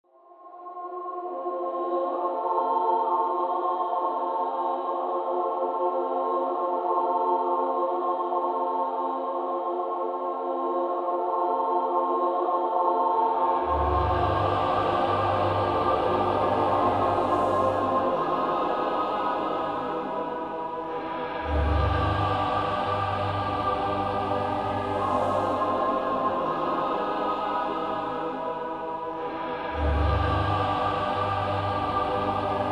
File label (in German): chor